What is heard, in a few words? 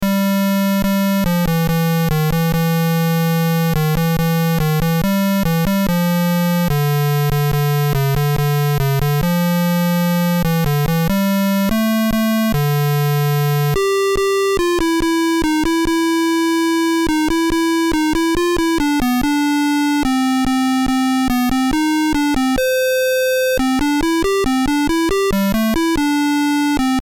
Stars and Stripes Forever, Player Piano; BASIC Manual